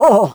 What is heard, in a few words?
squish; Crypt of the NecroDancer